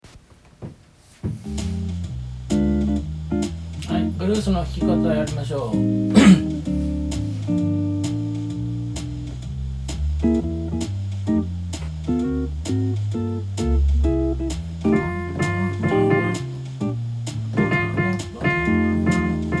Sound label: F Blues